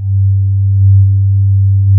STRING PADS; Pro-Rec - Synthsonic

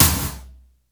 BG SNR, METALIC DRM

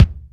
DARK FAT, KICKS

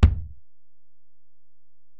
Ac BD, Alesis DM-PRO; 300 Drum Machines

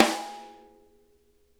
rim mf L, snare; Sonic Reality; Interactive Drum Kits

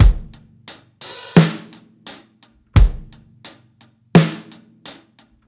87 HALF TIME 8THS, drums; LOOPS; Basement drums vol 2

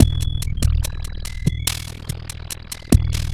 HOLOGRAPHIC BACKBEAT; FRENETIC FRACTAL GROOVES

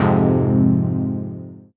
Fm Dub Chord

